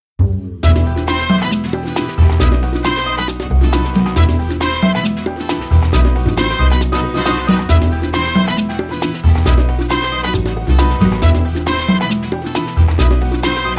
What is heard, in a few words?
Latin Warmup; Latin Samples